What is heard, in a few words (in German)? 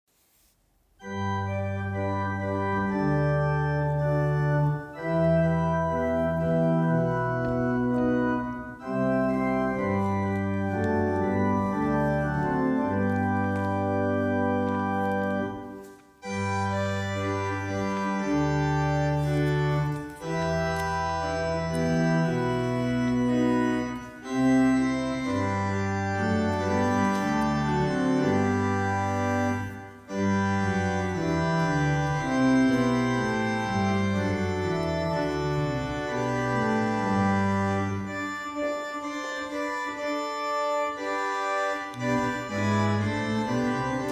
Orgelvorspiel